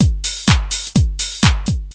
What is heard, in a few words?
126 bpm thin house, drum loops and breaks